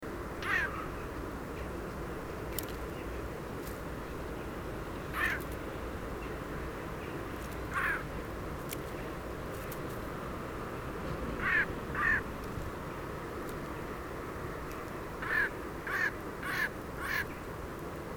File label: Garrulus glandarius; SETE